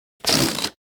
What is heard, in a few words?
balloon; Golden Crayon Sounds